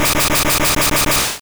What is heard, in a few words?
Twister Hit; attack moves; pokemon; gen 2; gsc